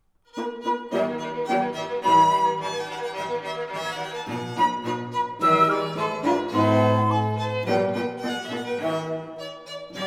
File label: quadrilles